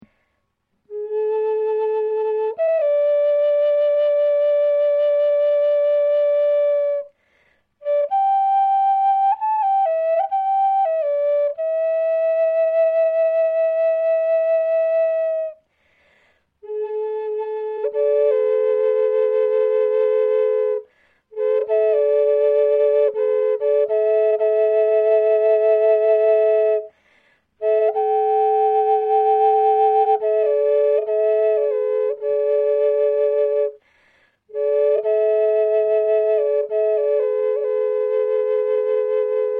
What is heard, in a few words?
Drone High D, Native American Drone Flutes; Scales; Full Spectrum Scale